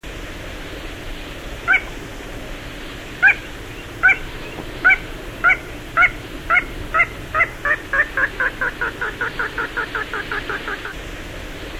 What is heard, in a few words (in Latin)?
Porzana parva